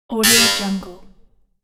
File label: sf automaton robot